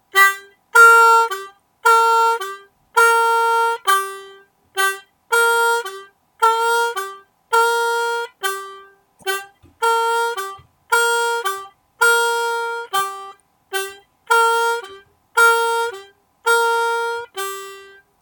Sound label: key of G, harmonica